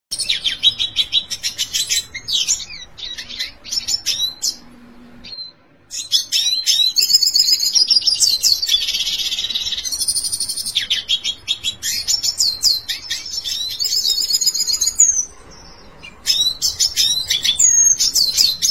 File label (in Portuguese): canto do pintassilgo negro